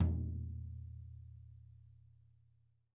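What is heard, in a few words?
floor tom; 90s sample CD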